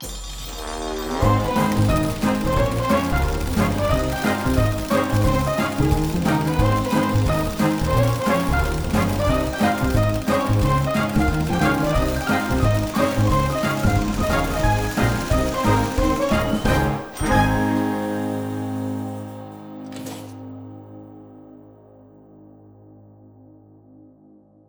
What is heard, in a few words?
epic Win; netent